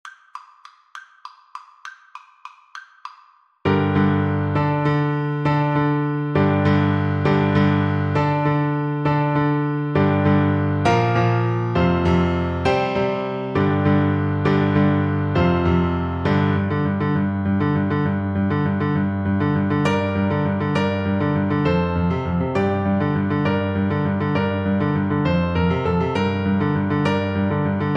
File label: Oboe